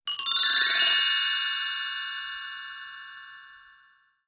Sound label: frostivus magic